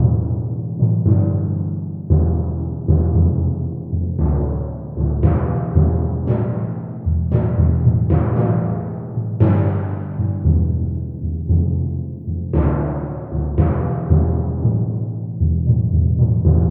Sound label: timpani; драма